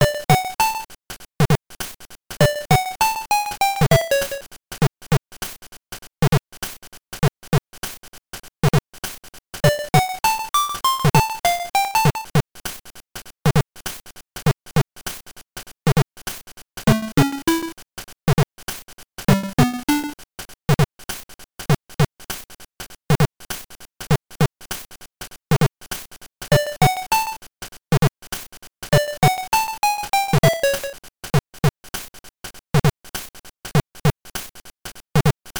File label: Machine; super mario land 2 six golden coins